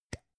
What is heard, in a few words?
gum plastic box open soft